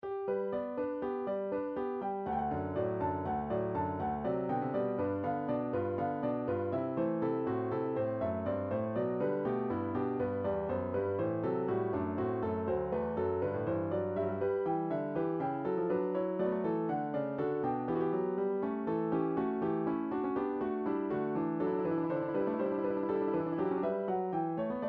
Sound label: piano, midi